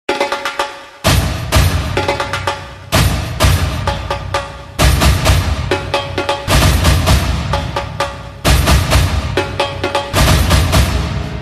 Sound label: drum beats